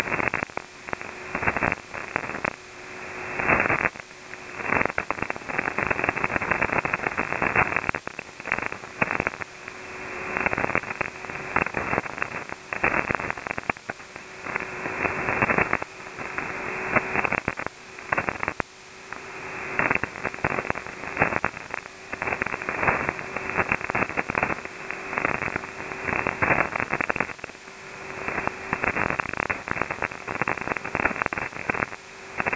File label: statics